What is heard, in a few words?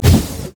baleful strike